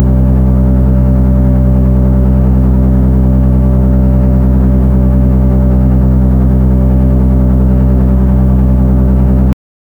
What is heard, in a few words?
steampunk engine working